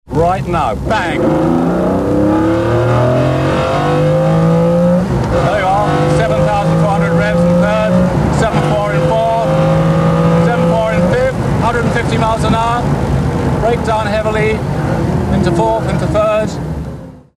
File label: McLaren F1